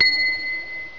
Crystal; Scripture Verses 1.3

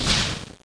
Missile; aggress

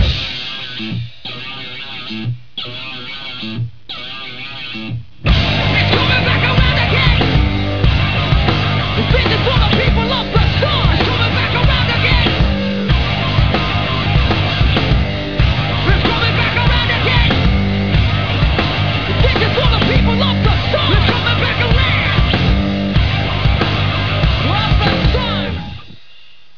rock

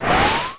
enemy generate; Ferazel's Wand Demo

